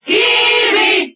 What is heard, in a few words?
Cheer Spanish; Kirby; SSBB